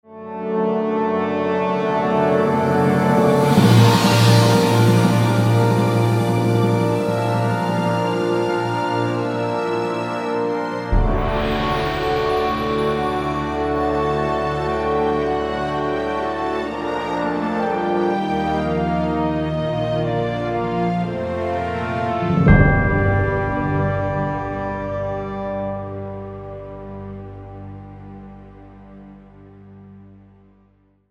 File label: Large Victory; CivCity Rome